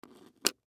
Pen Set Down Wood Desk; Office; Blastwave

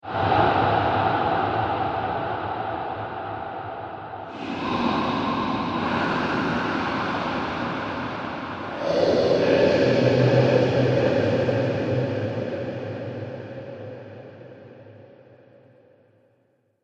Chamber Of Devils, Scary Soundscape Sound Effects

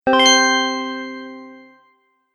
Email Received, beep sound effects; object sound effects

